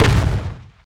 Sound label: Bullet Shot; The Binding of Isaac